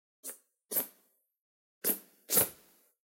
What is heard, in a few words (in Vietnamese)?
Tieng phui bui lam phang ao quan